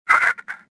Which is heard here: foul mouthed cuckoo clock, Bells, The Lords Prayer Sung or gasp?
gasp